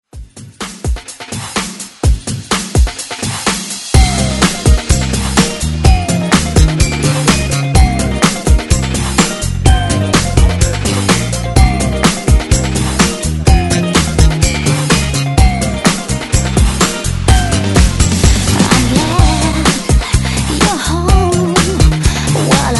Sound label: 20s Re Drum 126 Bpm; DJDOLLS